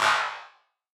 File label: Wider Clap; stereo toolkit samples